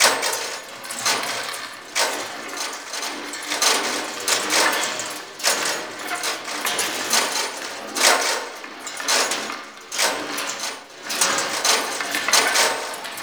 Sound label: Small Shaking Rotating Metal Prop Loop; Ambience; Props; Warframe; Entrati